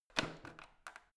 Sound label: washing machine creaky rotary button; haus garten; haushalt